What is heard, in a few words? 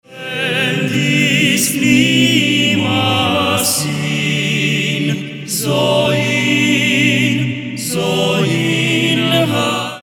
Voice Quartet; Hristos Anesti; florilegiu